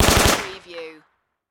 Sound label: Submachine Gun Sten